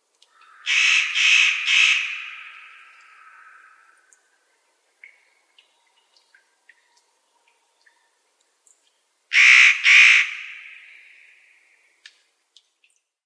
Tannenhaeher

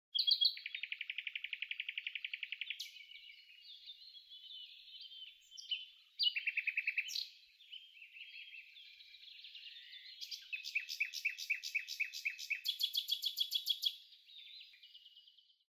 Philomele

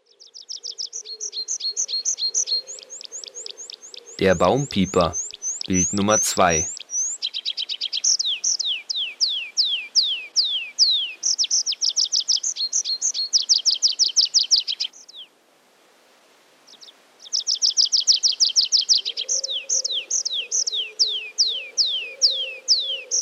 Baumpieper

